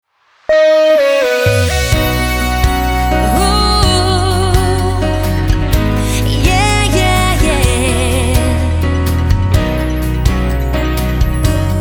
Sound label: Medium 2 126bpm Main ID, jingle; Warm 98